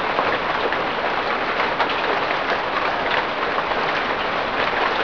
rain

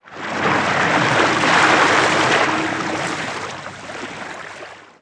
water lap

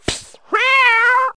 CAT HIT; Multimedia Cube; animals

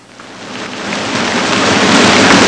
rain approaching; nature

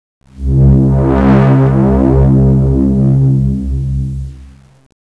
DRONE

